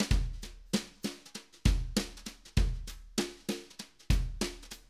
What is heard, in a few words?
Acoustic Live Drum